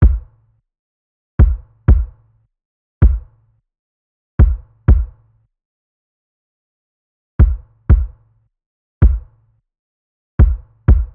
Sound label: kick 80, Kick Loops; drum sample pack; SEISMIC; Slate